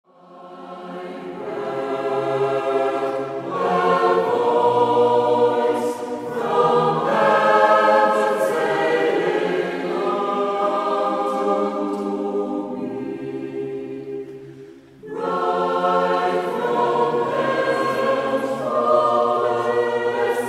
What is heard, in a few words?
Madrigalchor, I Heard a Voice from Heaven; Tomkins